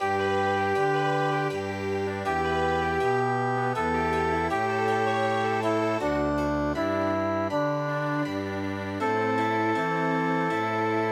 Chamber Music, midi; NONBUKA

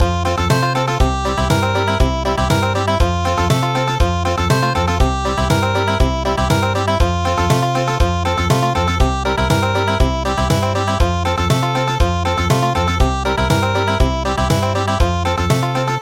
Samba Country; midi; traditional

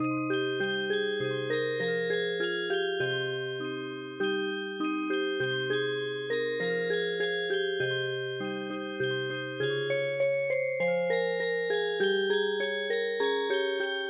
Pakistani Lullaby Music Box

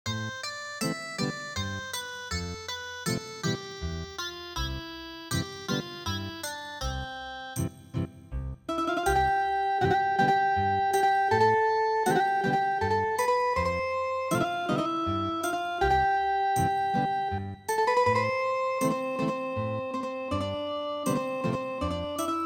Ethnic Guitar, traditional; midi

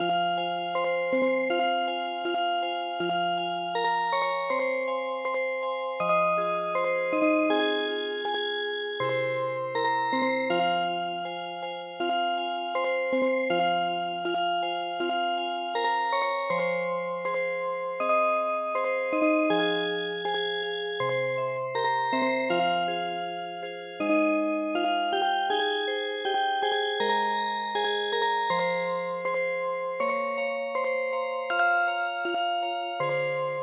lullaby Music Box; スウェーデン民謡の「いずみの子守歌」に癒し系のアレンジを施したオルゴール曲を聞くことができます。; 「いずみの子守歌」は、スローテンポな4拍子のスウェーデンの童謡です。; ＰＣ ＭＵＳＩＣＢＯＸ

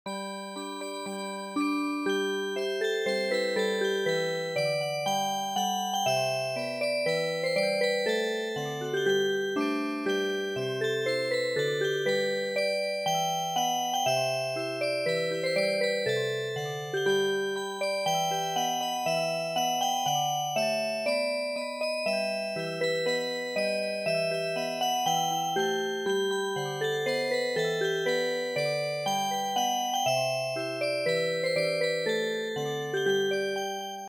Music Box, traditional

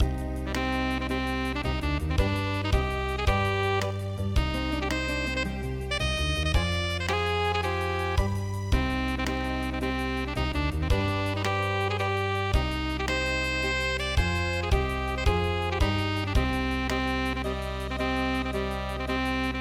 zundoko enka

